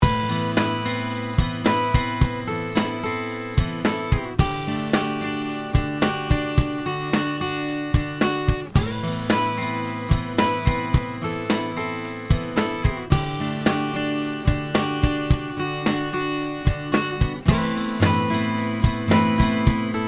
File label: warm audio neve